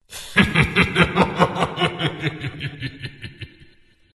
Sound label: laugh, zh; Dota 2